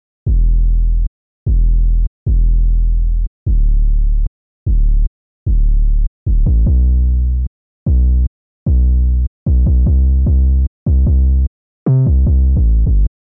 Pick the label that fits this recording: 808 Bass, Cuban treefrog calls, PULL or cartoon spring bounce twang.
808 Bass